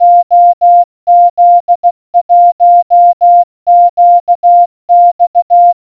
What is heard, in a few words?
morse code